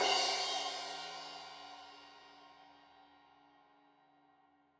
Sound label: Crash 15in L edge, Cymbals Stereo